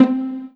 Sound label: Pizzicato; osu